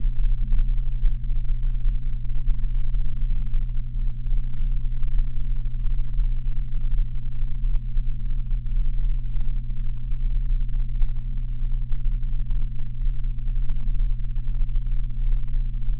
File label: 200 300Hz; LIGO